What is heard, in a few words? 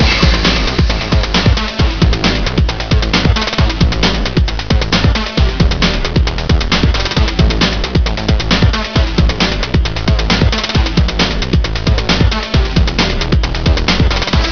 club song